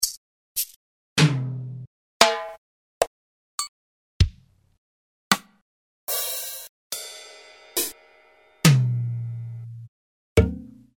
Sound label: mpc60